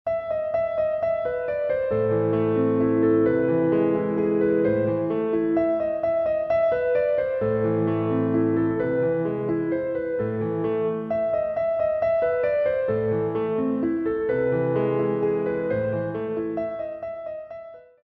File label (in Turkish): santral fon muzikleri